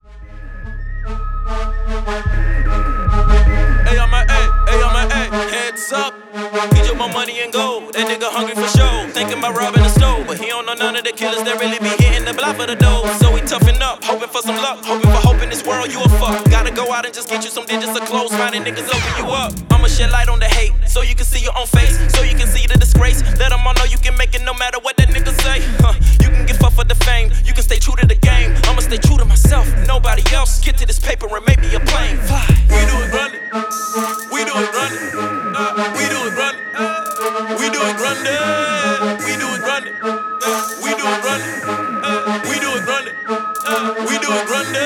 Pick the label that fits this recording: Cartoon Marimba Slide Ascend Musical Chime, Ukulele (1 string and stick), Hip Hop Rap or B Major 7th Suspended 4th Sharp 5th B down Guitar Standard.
Hip Hop Rap